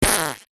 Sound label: cartoon fart sudden